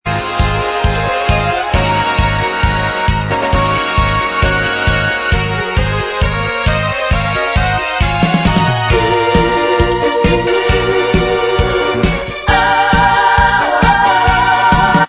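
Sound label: backing vocals Track, backing tracks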